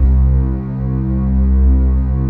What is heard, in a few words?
PLUCKER KEYS, pads; Pro-Rec - Synthsonic; TR PADS